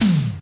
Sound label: short tom drum sound d sharp key